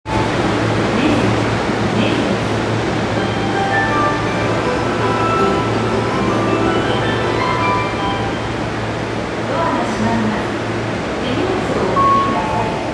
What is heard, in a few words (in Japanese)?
千代田線根津西, train sounds